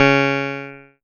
C#, PIANO; Big Fish Audio; Synclav FM

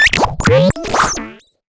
Cwejman S1 - Mechanical Arm

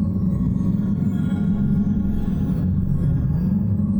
Tonal Re Draw, sparse soundscape

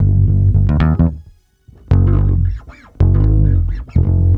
Bass Loop, Ambient Grooves

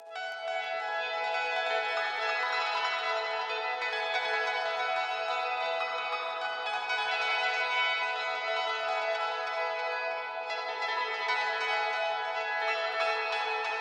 lush water pad

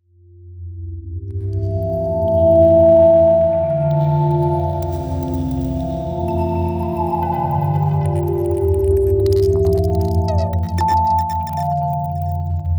Pad, Modular Electronica; Sonic Foundry (Sony Creative Software); TSS Autopilot

